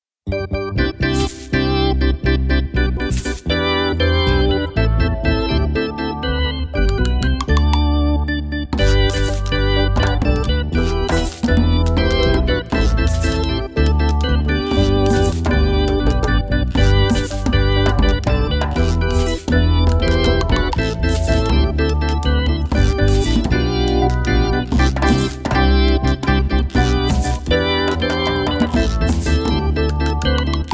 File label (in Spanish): Pista Siyahamba